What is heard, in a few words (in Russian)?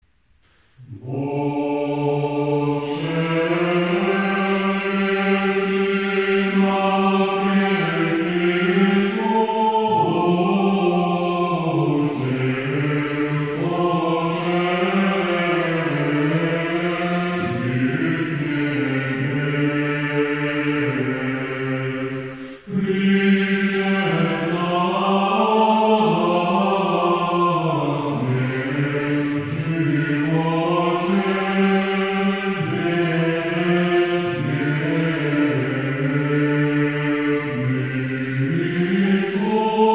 Стиховна 8 глас; Возшел еси на Крест